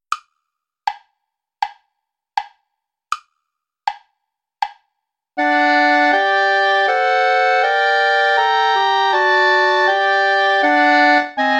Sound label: play along without clarinet; bach johann sebastian; god from heaven look